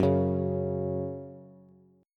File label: F sharp 5th F sharp Bass